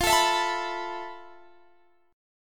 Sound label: F Major Sharp 11th, Guitar, Open G; x,22,19,21,22,21; down; chord shape